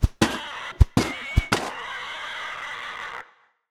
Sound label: assault punch; cardboard kaiju; sound design